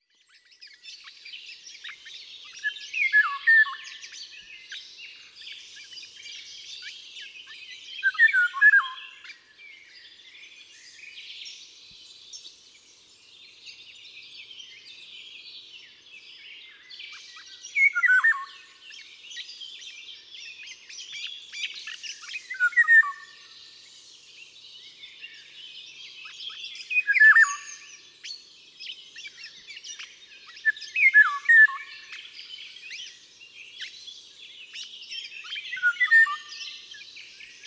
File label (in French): une voix dans la canopee